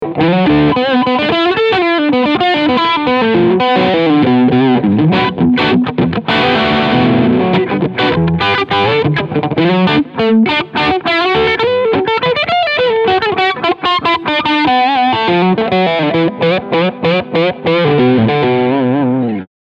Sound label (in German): Steg P90 Overdrive